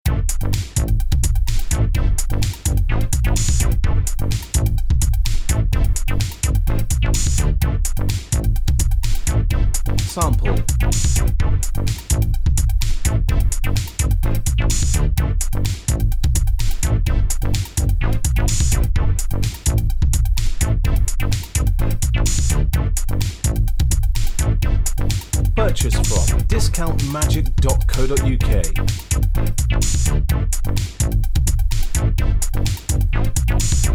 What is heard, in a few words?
electro, backing